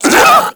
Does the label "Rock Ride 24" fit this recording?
No